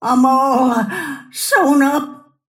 death; snapfire

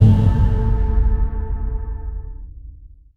blink layer; weapons; dota; earthshaker

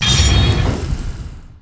double edge; weapons; dota; centaur

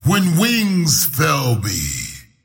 teleport pain; slyrak; dota

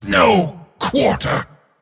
attack pain; dota; mars

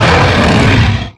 thunder lizard roar; weapons; dota; creep; neutral

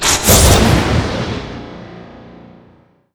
reverse polarity; weapons; dota; magnataur

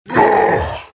morph pain; terrorblade

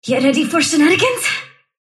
sylph move pain; dota; dark willow

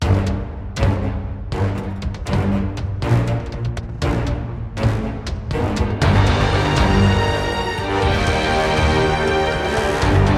stinger; ui; stingers; drow arc

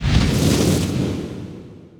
tornado cast; weapons; dota; invoker

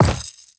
quiver drop; inventory

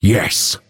move pain; dota; phantom assassin

